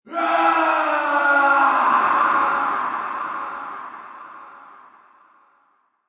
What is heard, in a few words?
Male Scream Reverb Echo, Male screams in pain, agony, death; Horror - Male screams in pain, agony, death, torture or fall into abyss. Heavy reverb; Screaming Sound Effects; Lynne Music